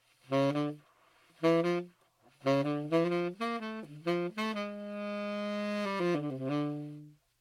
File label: saxophone